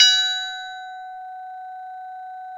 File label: triangle, Yamaha TG-500